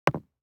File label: DICE on Neoprene, Drop, Standard, 1 One Die; Samples; ArticulatedSounds; DICE Sfx Party (Extended)